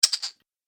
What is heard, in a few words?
Gremlin Laughter, monster sound effect; Gremlin Laughter 02; Horror; Andrew Fleming